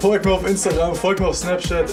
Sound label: death; spackenmobs; apored